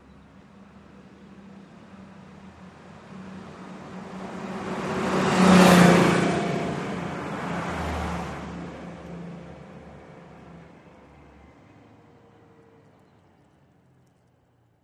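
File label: City Bus Pass By Fast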